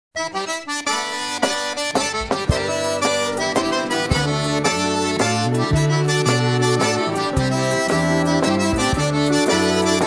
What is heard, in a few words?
Waltz